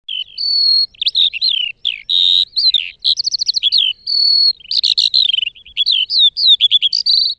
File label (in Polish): skowronek, skowronek polny, śpiew; wroblowe